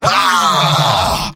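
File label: engineer pain critical death; tf2; mvm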